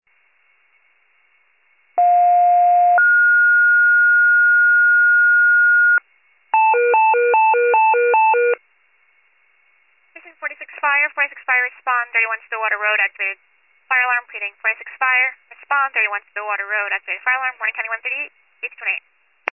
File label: FIRE pager alert